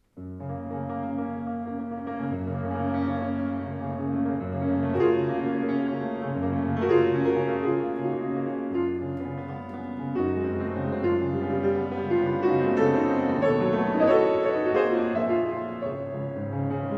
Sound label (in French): cloches; debussi